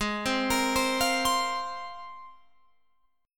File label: Ab 6th Add 9th Ab, Guitar, Open F; 20,19,22,19,20,19; arpeggiated